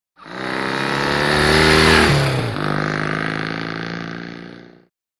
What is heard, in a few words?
Motorcycle; By; Motorbike Up To Mic. Change Down